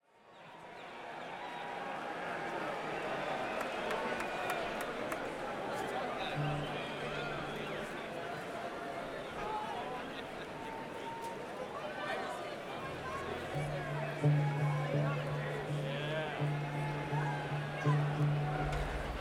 Crowd; Grand Rapids, MI - Van Andel Arena

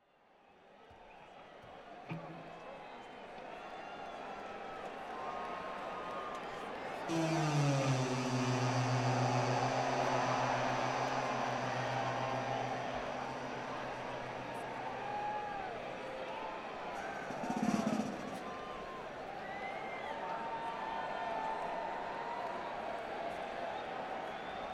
Crowd, Madison Square Garden; New York, NY

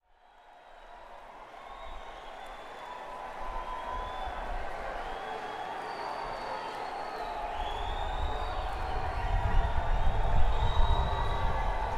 Crowd; Canandaigua, NY; Finger Lakes Performing Arts Center

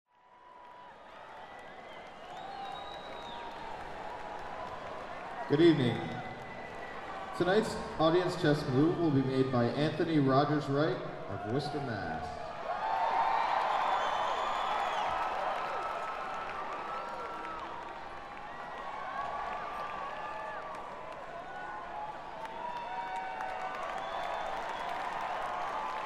audience chess move

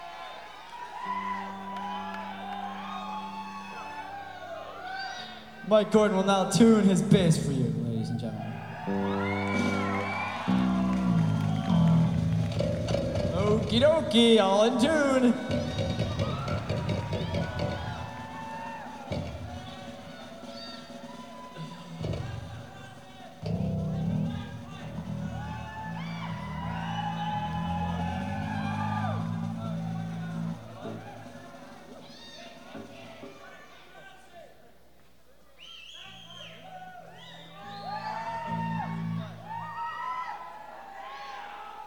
Tuning; Cleveland, OH; The Agora

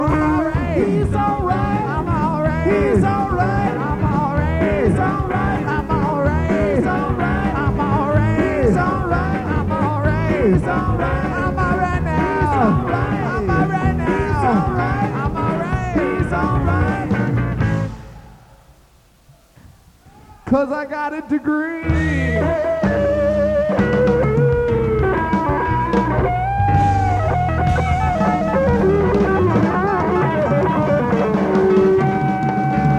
Alumni Blues; Concord, NH; The Upper, St Pauls School

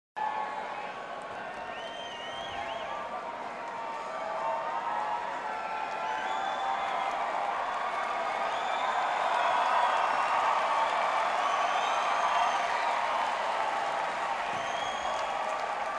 Crowd; University Park, PA; Recreation Hall, Penn State University

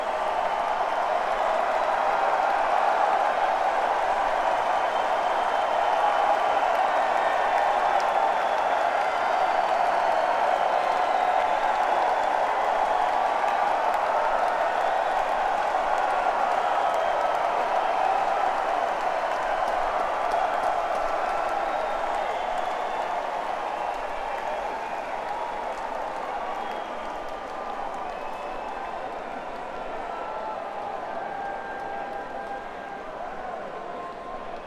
Crowd; Columbia, MD - Merriweather Post Pavilion

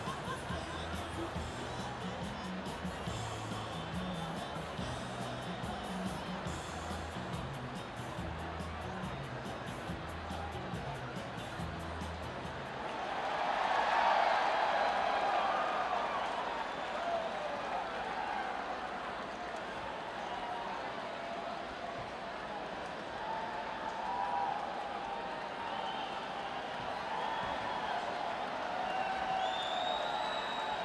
Crowd, Saratoga Performing Arts Center

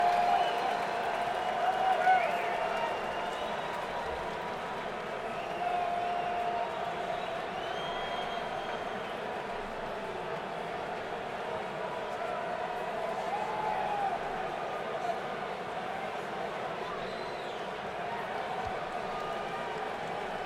encore break; Saratoga Springs, NY

